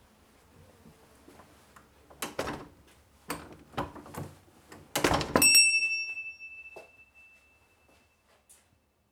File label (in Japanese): 玄関の鈴